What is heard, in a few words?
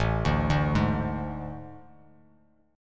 A Major 7th Suspended 4th, Bass Standard; AM7sus4; arpeggiated; JGuitar